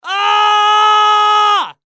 Human Scream; Scream Angry Long Element Male 20 Yrs; Blastwave

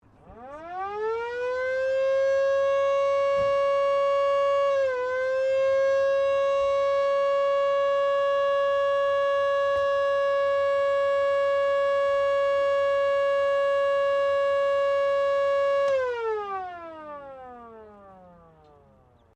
JEEP US ARMY SIREN OPERATING, Electric Siren; Cars; Vehicles

